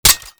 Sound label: Revolver Rifle Mech; Warframe; Tenno